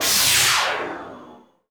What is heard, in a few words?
Lazers